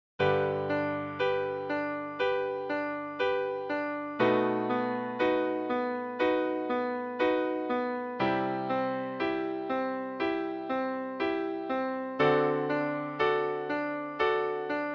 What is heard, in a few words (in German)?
uebung piano solo